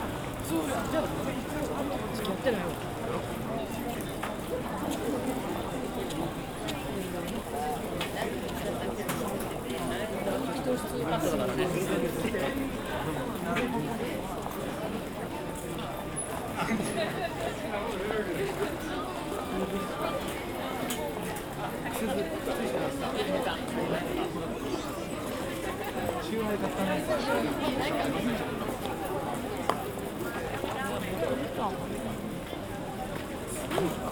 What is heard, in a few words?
Binaural; mishima